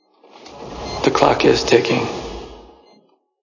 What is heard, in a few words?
ticking